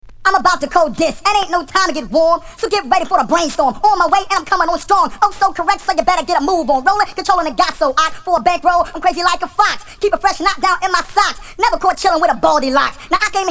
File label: Acapella